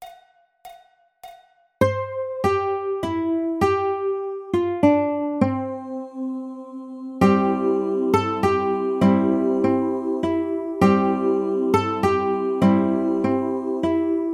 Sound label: Silent Night Ukulele